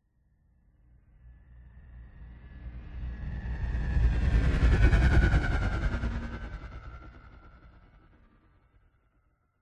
Passby Designed Whoosh Futuristic Scifi Airy Synth Spaceship Slow; PMSFX